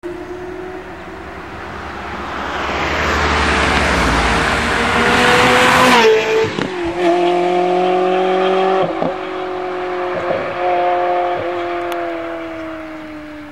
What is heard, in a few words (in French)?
360 - passage, ferrari